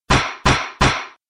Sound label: gavel pound x3